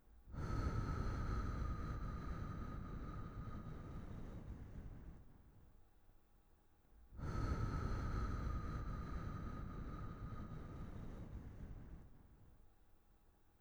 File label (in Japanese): 息吹き